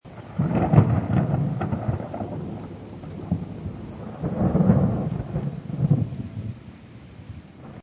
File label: Thunder Stereo Loop, Looping continuous thunder in distance. Seamlessly looping sound.; Weather